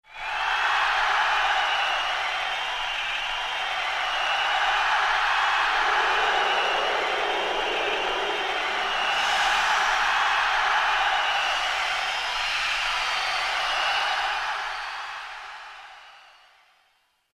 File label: hurray!; Roland M-DC1